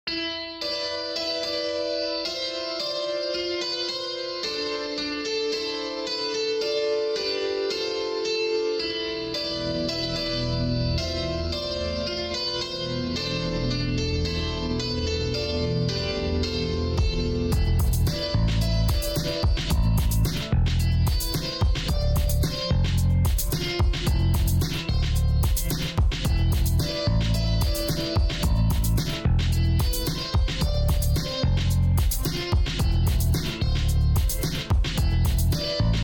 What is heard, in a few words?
HipHop